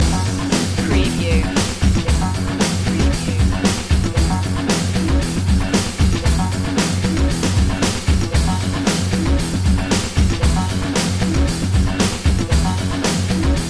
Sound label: rock invictus loop